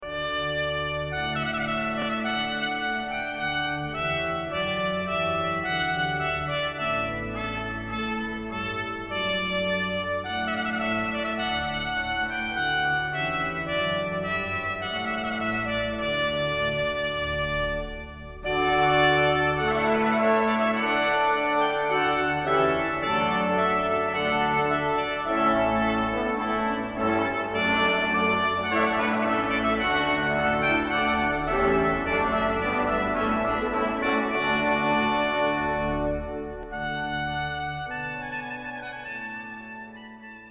trumpet voluntary

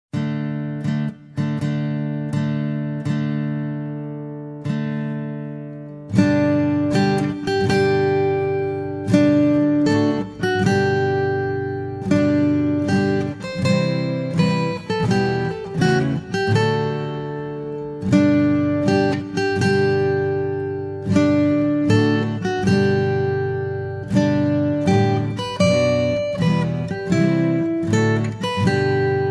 march guitar

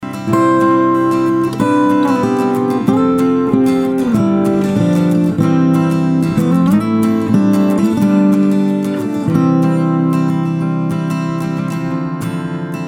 bridal Chorus

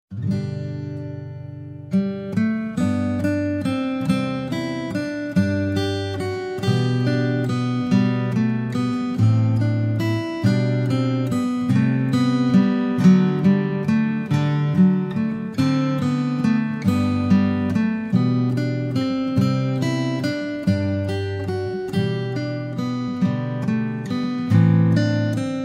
jesu guitar

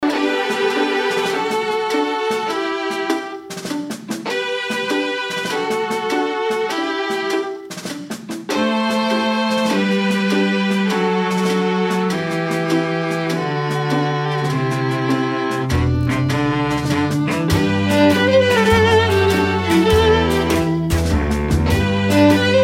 strings; ceremony music

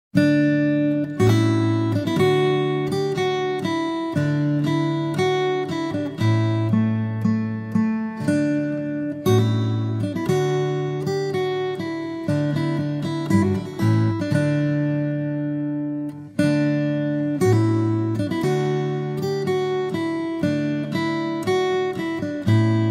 trumpet voluntary on guitar

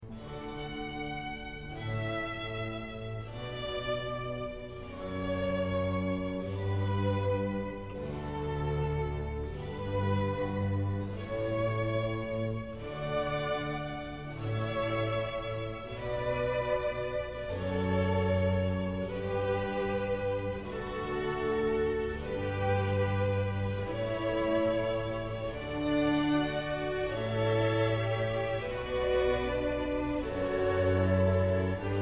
canon strings

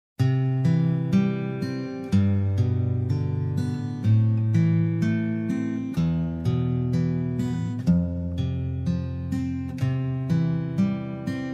canon guitar

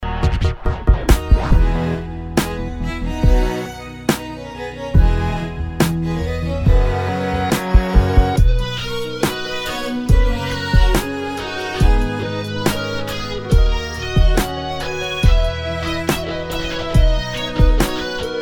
canon remix; ceremony music

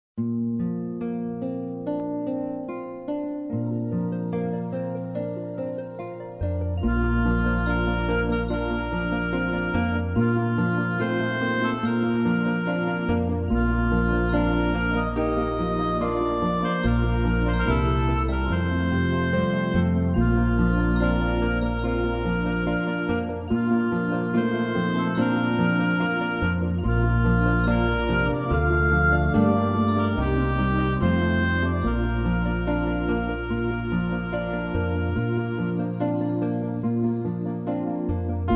march piano

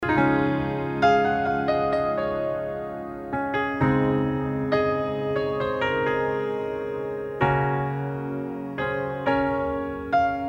piano

